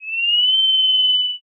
kettle

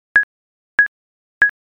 ecg

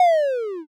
shogeru

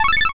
arpeggio

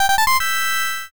tete classic

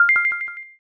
quick press

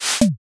arrow hit

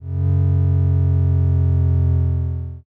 ship horn

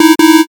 wrong

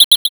sparrow

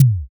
kick

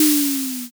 shoji

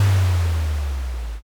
dungeon door

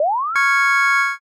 revive

